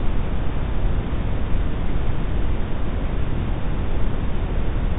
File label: thrust; space game